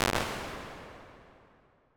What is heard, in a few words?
Dark Chamber, Impulse Responses; Large Spaces; Rooms; Apple